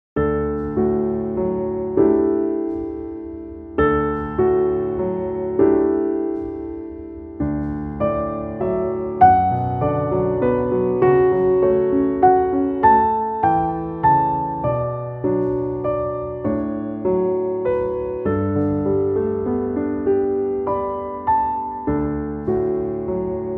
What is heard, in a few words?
Piano